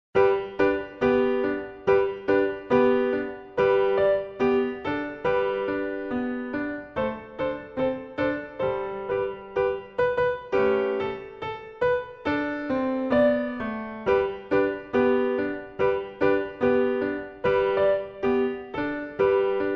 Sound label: Jingle Bells